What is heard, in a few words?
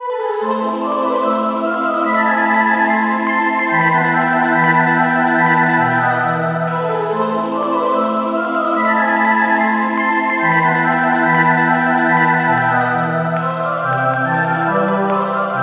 echo drops